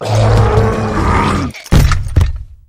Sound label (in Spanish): caida